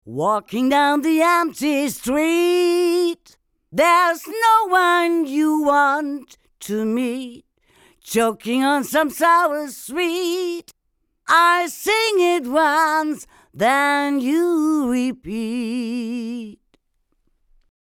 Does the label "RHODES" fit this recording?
No